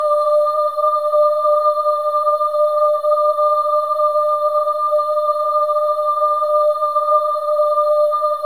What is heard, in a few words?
SOLO BOY, AH; Spectrasonics; Symphony of Voices